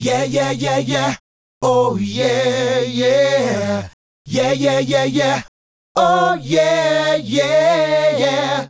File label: Male Group, Hip-Hop and RnB Vocals